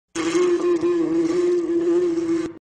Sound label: wormy